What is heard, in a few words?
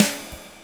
Snare OneShot, Processed Percussion; Sonic Foundry (Sony Creative Software); Rhythmicronics